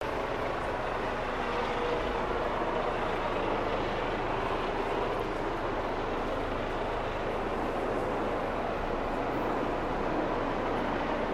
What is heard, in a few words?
tank